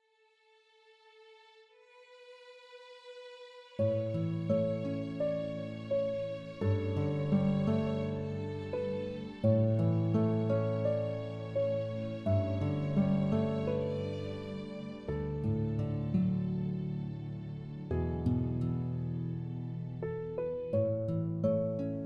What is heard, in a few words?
Savanna BGM; Alchemage